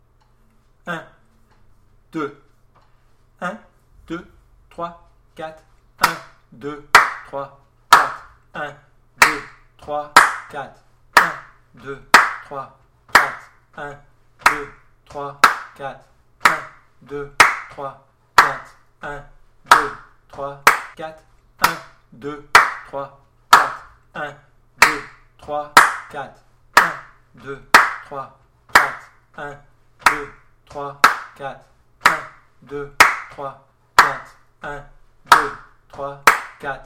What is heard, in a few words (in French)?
Bossa Rythme Frappé 92